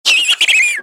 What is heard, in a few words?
yell